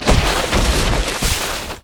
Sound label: Ski Tumble Fall; Misc Sports